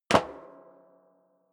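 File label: step sneaker metal rattle stair up, footsteps; schritte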